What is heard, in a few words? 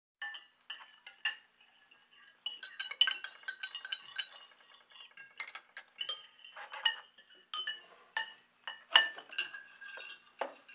Silverware Movement; utensils